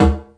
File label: Didgeridoo Kit Samples